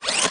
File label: Rover Hop; crossyroad